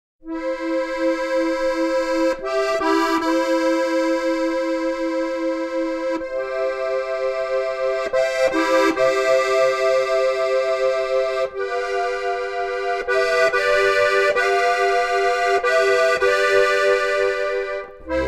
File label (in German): harmonika